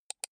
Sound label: computer mouse right click soft fast